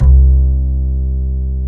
Acoustic Bass

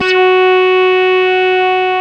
SYN MORP, Roland; Sound & Vision - Gigapack II; Morpheus

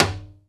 muted rim Timbales; E-MU Producer Series Vol. 2 – More Studio Essentials; Composer; Timbales Xswitch

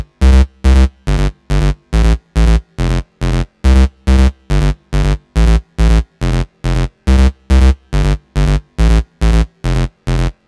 140BPM C; Vengeance - Essential Clubsounds Vol.4

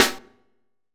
SD, Dance Mega Drums; Best Service; SD-HIP

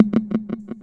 Tom Delay, TR-606 Bent Samples

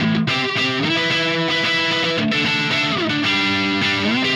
Guitar, Indie Rock

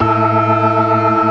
ORGAN ALL STOPS; Keith Emerson Signature Series

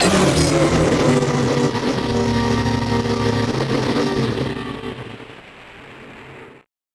Malfo Syn, malfunctioning synth

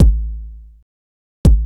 Solo Kick Loop; Sonic Foundry (Sony Creative Software); Club Joints and Street Anthems

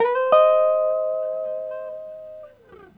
GUITAR LICK; Ueberschall; Jam Box

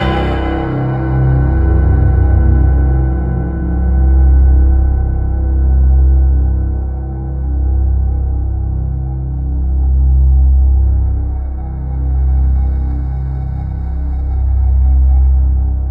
C1, Synth Line; 90 s Sample CDs; NiceBeats; SynthLine VOL-11

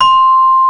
CELESTA; East West; Global Sounds

